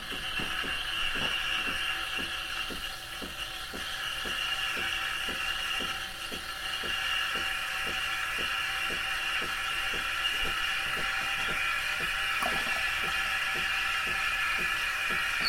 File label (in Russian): prigotovlenie kofe